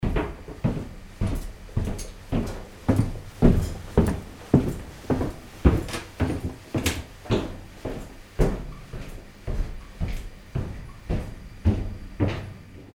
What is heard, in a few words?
steps in a train